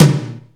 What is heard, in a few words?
wet tom sample D key; tom drum